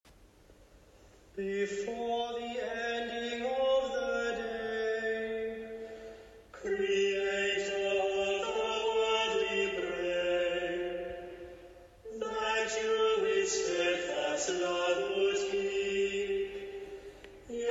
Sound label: Compline Hymn; Before the ending of the day